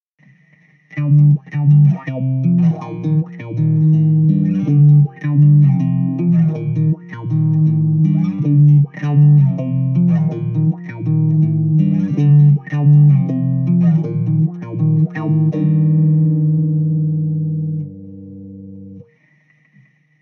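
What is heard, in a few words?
Gm 160 guitar riff trash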